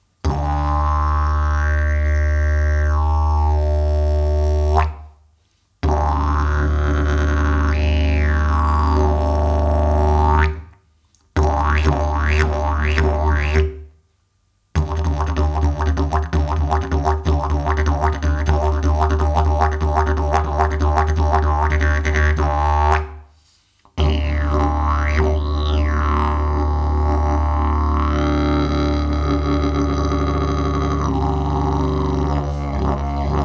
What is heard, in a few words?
didgeridoo; jasan mandala